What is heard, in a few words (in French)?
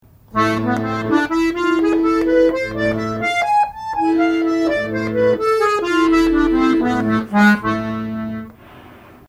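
gamme la mineur harmonique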